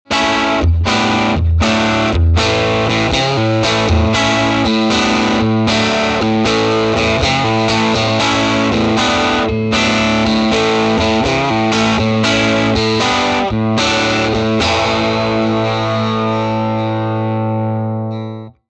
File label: Two 57 Deluxe; fender